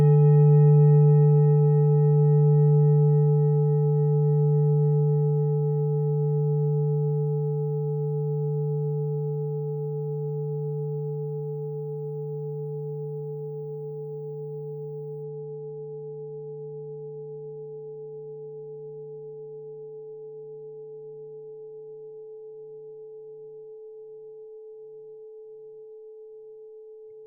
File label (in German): klangschale set; Klangschalen-Chakraset; Gewicht = 1430g; Durchmesser = 22,4cm; Höhe = 10,9cm